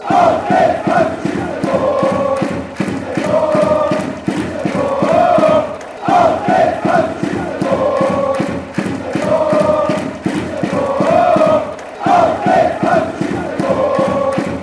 a goal, fan chants

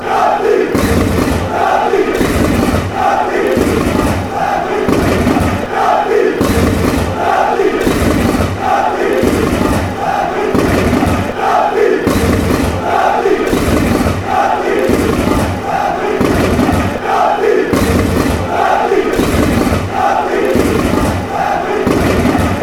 racing racing, chants

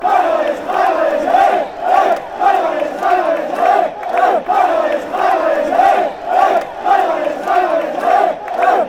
come hey, chants; varese

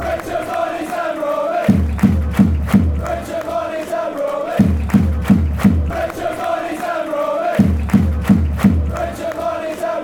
amber army drums, chants

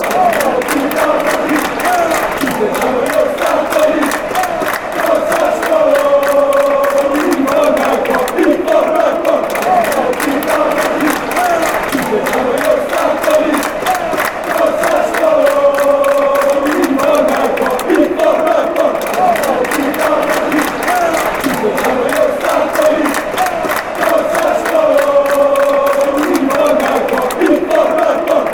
obstacles, chants

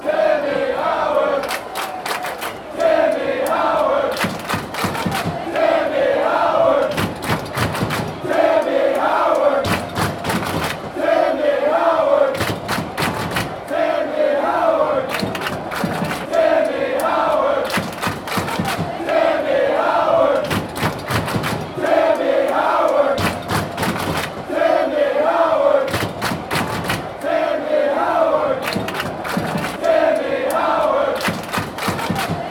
claps, fan chants; timmy howard

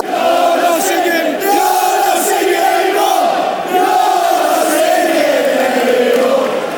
youre not singing anymore; oafc

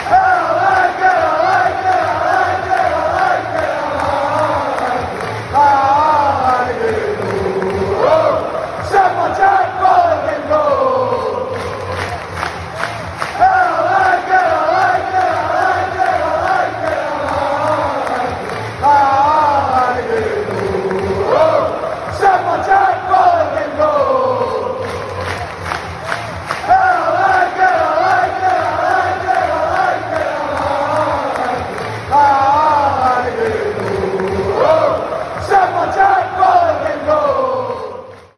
player chant; super jack butland in goal rangers

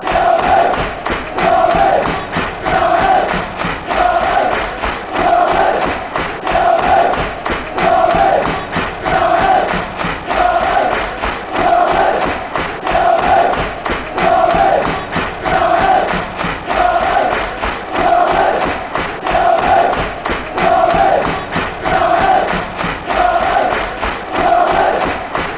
green army version fanchants

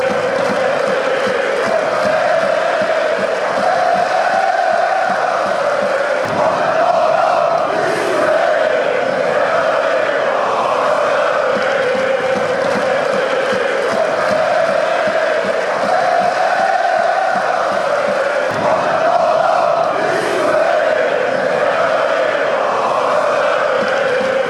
again and again, fan chants; ernst h stadium

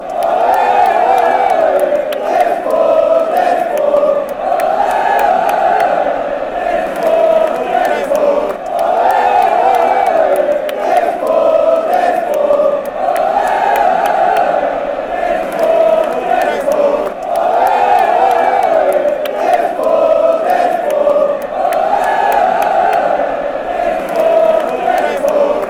ole ole ole ole, fanchants

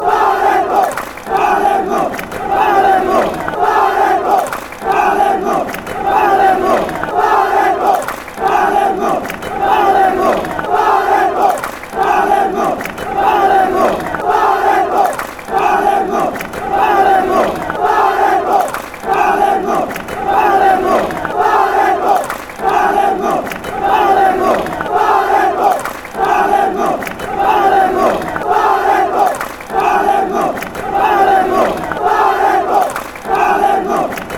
palermo fanchants

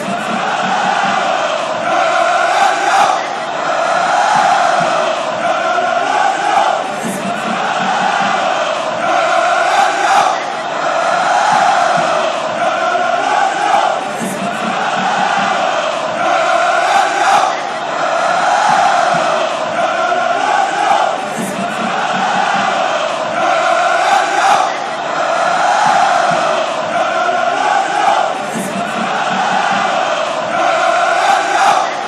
fan chants; lo lo lo granada

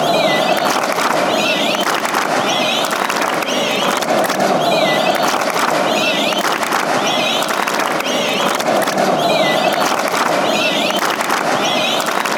whissle

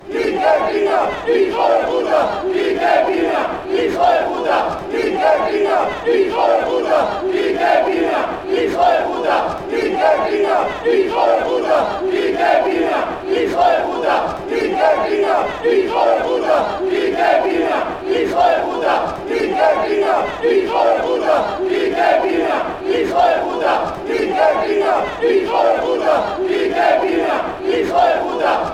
hijo de pta fan chants; almeria

